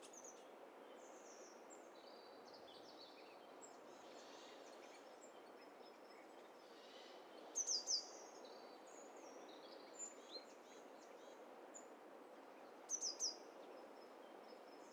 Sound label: STEREO BIRD COCKTAIL PARTY